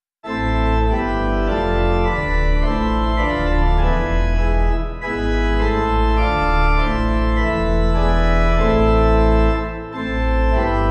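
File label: Pipe, hymn backing; Der Am Kreuz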